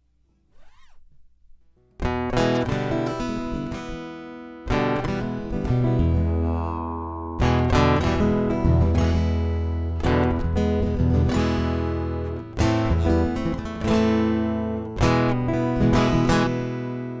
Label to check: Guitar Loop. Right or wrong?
Right